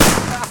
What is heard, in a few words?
Fireworks Close Explosion